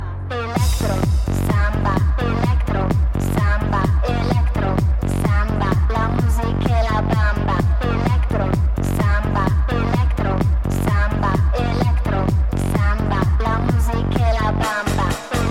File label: electro samba